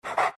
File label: pant small, greevil; courier